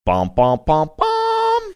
singing first few notes

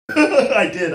laughing saying i did

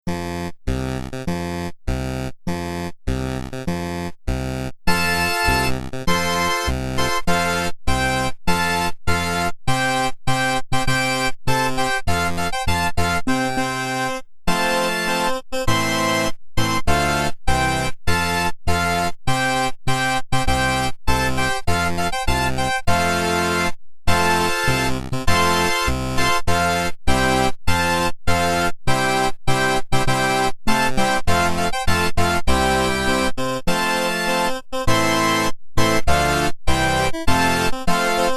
8bit olympics theme long

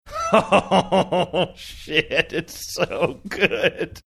laughing saying oh shit it's so good

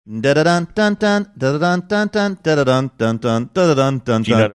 singing song

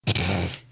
snorting

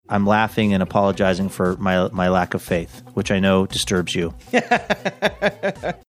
im laughing apologizing for my lack of faith

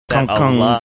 dun dun sound; law order

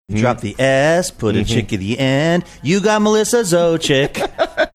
singing ditty; about donor of the days name

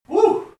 whoo

